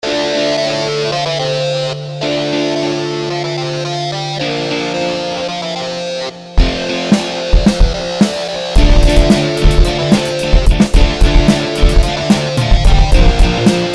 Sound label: metal-minus